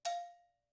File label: agogo Bell ff, Struck Idiophones; Agogo Bells